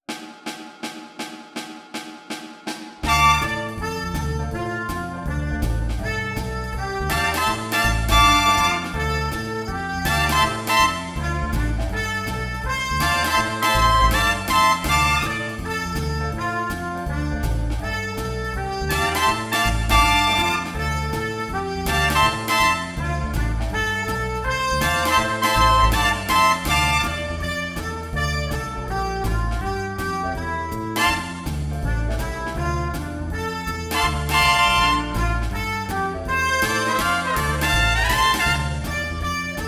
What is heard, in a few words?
Recorder Jive, Learning Speed